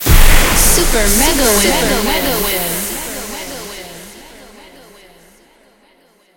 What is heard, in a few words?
super mega win text change; netent; doublestacks